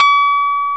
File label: KEY MKS20 E, MKS-20 E.P; Roland L-CD701; Pop Pianos 2